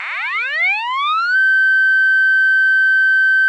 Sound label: tone sirene